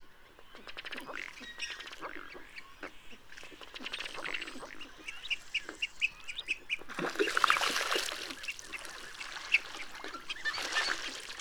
Male bubbling and ringing rush with calls of females